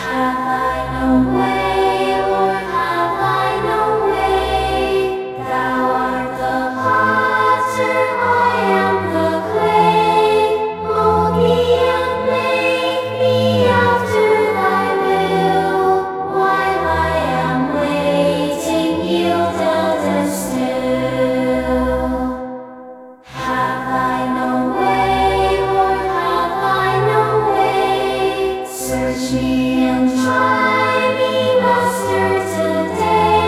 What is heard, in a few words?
choir